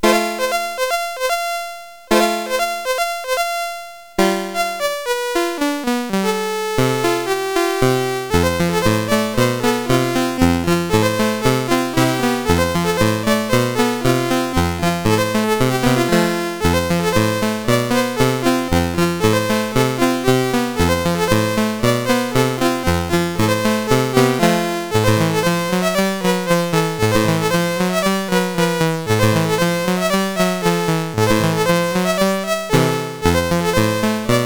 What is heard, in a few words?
Boogie, sid CSG8580R5; Master Composer